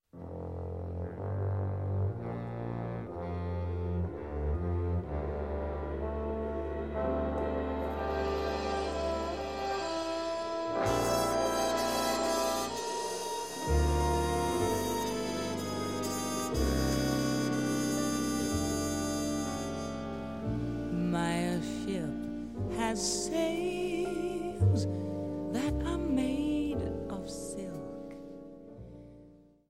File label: Kicks and Sticks